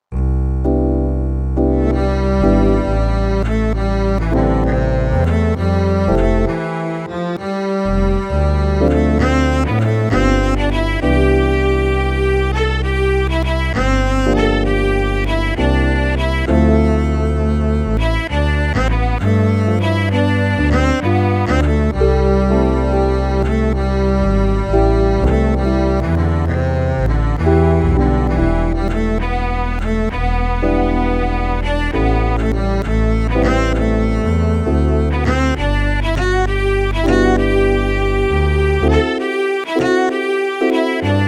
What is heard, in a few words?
Lullaby